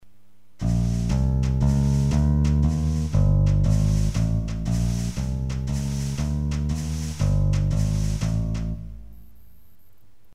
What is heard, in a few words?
walkingbass